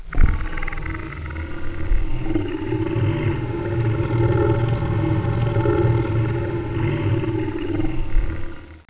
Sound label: dragon growl